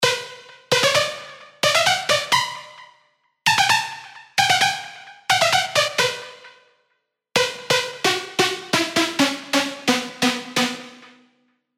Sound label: Hard Punch, SERUM